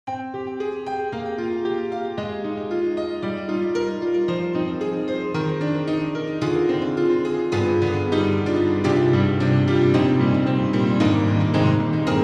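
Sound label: Trem keys, piano; Steinberg HALion 6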